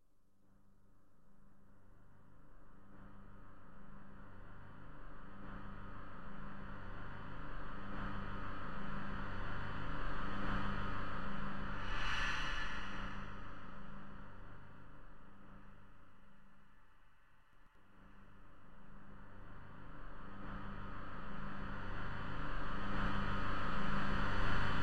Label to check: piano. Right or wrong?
Wrong